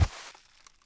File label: Animal Foot Large Sand; Civ4 warlords